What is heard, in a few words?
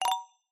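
multimedia game sound mallet notify exclamation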